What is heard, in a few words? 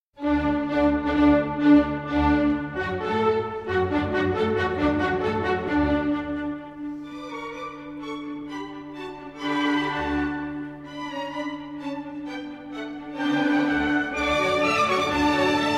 Violin Concerto No. 4 in D Major, Allegro; Mozart; K. 218